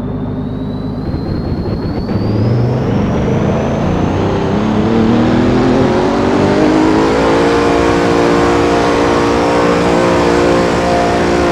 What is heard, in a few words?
rev, vehicles cars; honda nsxr; lwcars